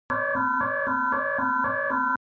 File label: sci fi alarm facility; smartsoundfx